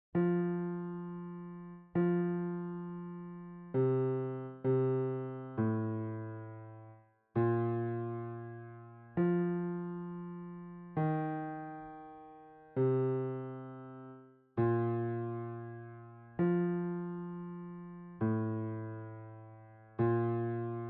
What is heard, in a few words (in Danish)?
bas